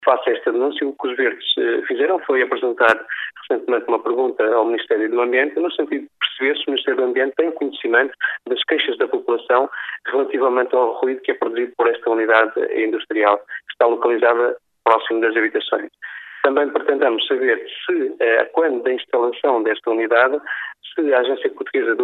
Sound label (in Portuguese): ruido